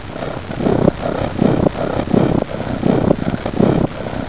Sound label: purr, Animal